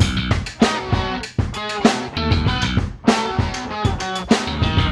ROCK TONE, ringtones; Google; NewWave Labs

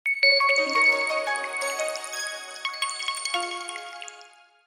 shut audio; Lenovo A536

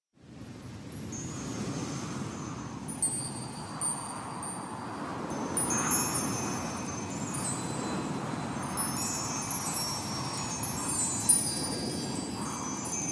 snowstorm, alarms; Google; Google Sounds; Seasonal Celebrations

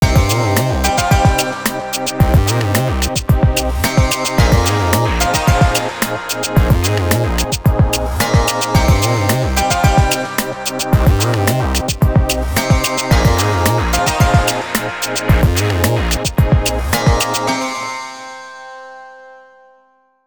Wind, phonetones Nokia; PlusFourSix; Lars Edvall

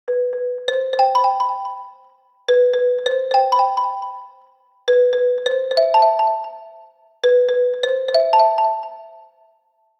Circus, ringtones; KONKA; SP6

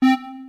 Key Tone; Samsung; SPH-W4200

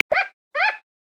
Petaurus Breviceps; Xiaomi Redmi-Note-8-Pro

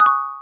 ui location notify; ui sounds

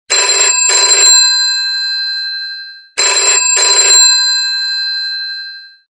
Rings; Motorola ROKR-E6

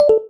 voice app cancel; BlackBerry OS-10; voice app sounds

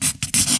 Pencil Writing; Realme 8

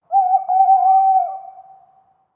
a real hoot, alarms; Google; Google Sounds; Pixel Sounds

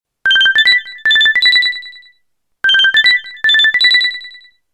LULLABY JINGLE, Ringtones; LG KE850 Prada

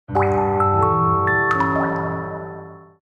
Dream Drop, notifications; Sony Xperia A SO 04E

